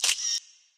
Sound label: camera; Source Engine; tf